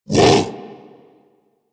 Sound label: Predasite Vocal Bark; Warframe; Hunting Critters